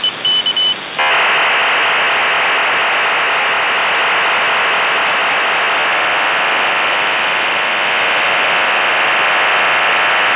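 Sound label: usb psk-8 2400 baud; 7641khz; acf40ms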